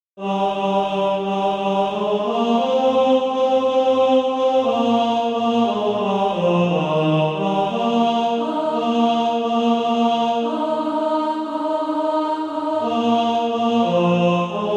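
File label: tenor, SATB Practise Music; Draw Me to Thee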